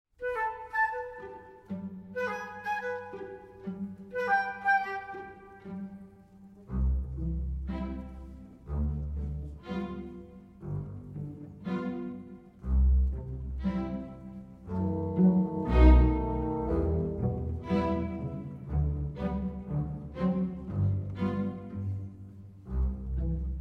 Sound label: piano